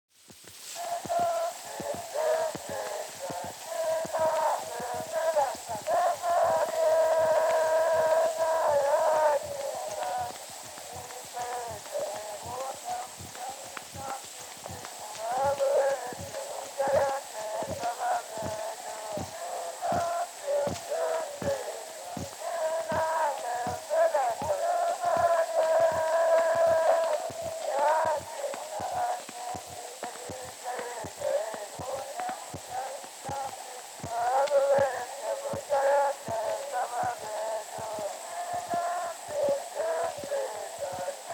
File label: Zulu Recordings; Father Franz Mayr